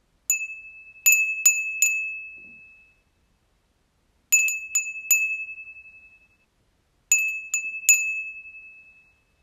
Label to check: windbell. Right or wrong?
Right